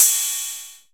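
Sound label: CYM RIDE, ride cymbal; 90 s Sample CDs; Sound & Vision - Gigapack I CD 1 (Roland); Dance-Kit 2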